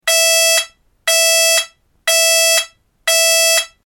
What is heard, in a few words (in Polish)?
ton